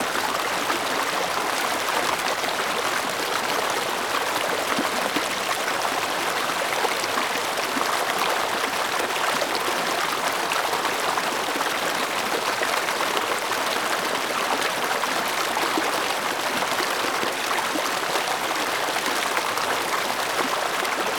Stream Water, Medium Flow; lilesound

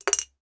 money piggy bank coin insert; sound effects one

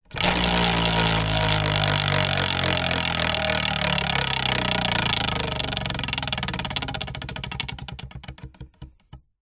leisure wheel of fortune wooden spin fast

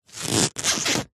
vehicles bicycle tyre squeak creak rub movement; zapsplat

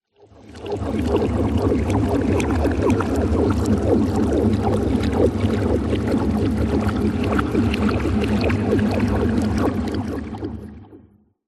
sound design weird bubbles reversed reverb delay

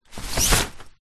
foley tent door canvas material movement; zapsplat

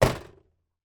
van door shut external

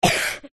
human boy aged 9 cough

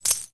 fantasy Pickup Gold; little robot sound factory

